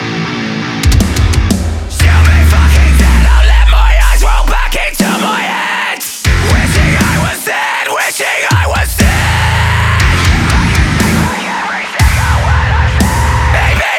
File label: subdrop